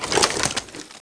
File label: arba deploy; weapons